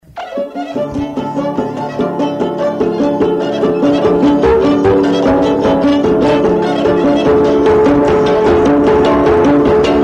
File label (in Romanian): Papuceii taraf; jocuri si cantece batranesti; Vaslui